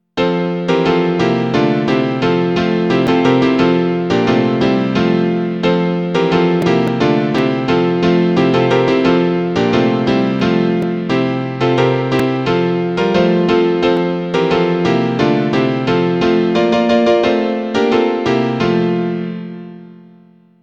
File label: Deck the Hall piano; Weihnachtsprojekt 2024